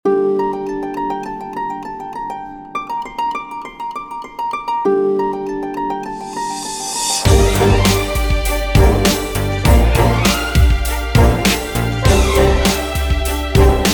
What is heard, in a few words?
Harp Attack